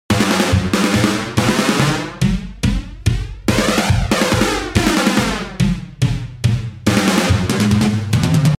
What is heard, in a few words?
142 FLANGER LOOP